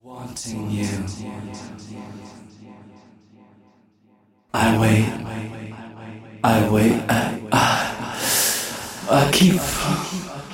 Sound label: acapella